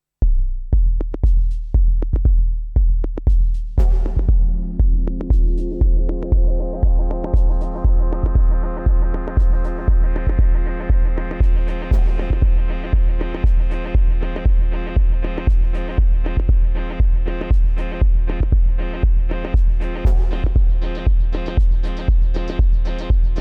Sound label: Roland TR 8S Pattern